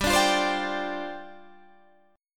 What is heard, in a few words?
G 7th Suspended 4th G down Guitar Half Step Down